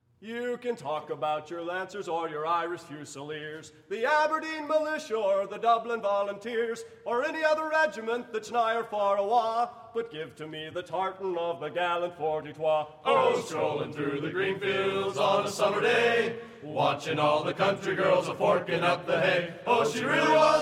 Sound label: vocal; The Gallant Forty Twa